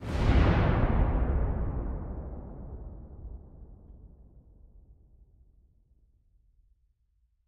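vzriv slishimij na dalnem rasstoyanii, explosion heard at a long distance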